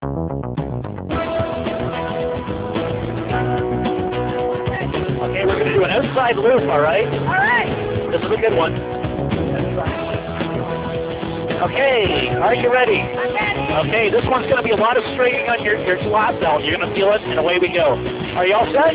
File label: outside loop dialup